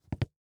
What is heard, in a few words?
FOOTSTEP Leather Wood Hollow Jog